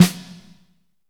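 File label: snare, Pop Country, Dry; Northstar - Drumscapes Roland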